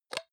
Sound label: hygiene small cup open hard; haus-garten; badezimmer